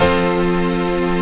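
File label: chord pad, Pads; Best Service; XX Large - Pads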